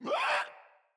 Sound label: Infested Runner Pain